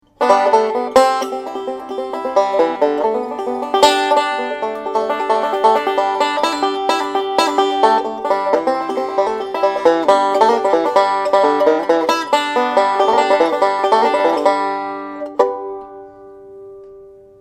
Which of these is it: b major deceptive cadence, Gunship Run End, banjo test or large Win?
banjo test